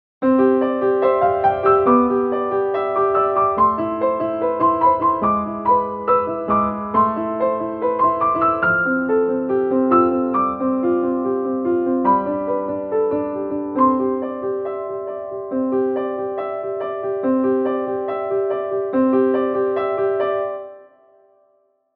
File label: Sketchbook